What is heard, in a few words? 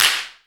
CLAP, HAND CLAP; AKAI S6000 CD-ROM - Volume 5